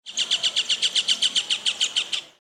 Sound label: kingfisher assustou se